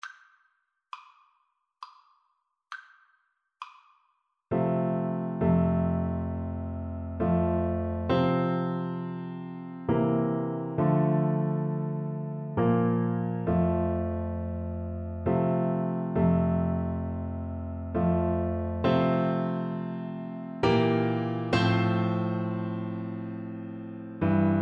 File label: amazing grace Euphonium